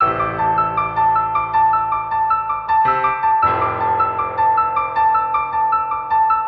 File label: piano, sad